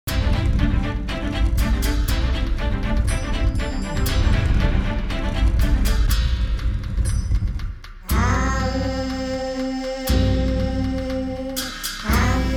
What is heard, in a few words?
The Fakir's Song (Percussion); Mystical Pique; Rejtélyes romok